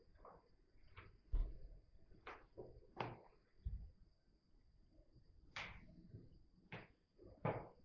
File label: 2nd Floor Bedroom Read Room EVP; andrew bayne memorial library follow up investigation